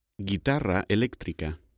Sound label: musical instruments